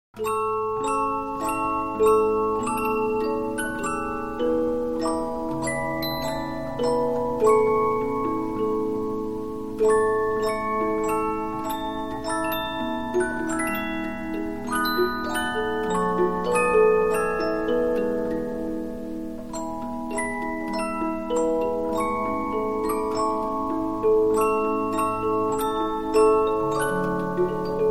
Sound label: Polyphon, 15inch disc musicbox